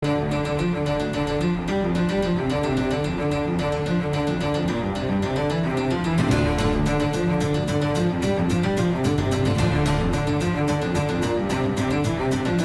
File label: Bagpipes Cello; Pirates of the Caribbean